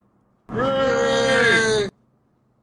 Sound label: unenthusiastic cheer